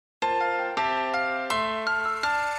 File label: Tip Taps Tip